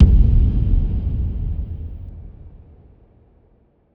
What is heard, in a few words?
BOOM Go Low Go Deep; TRAILER TOOLKIT VOL.1